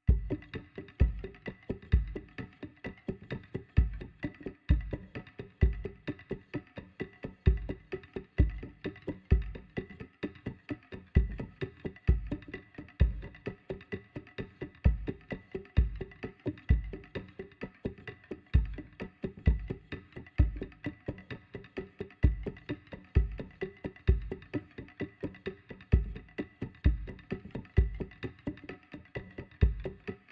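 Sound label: Forest Music Percussion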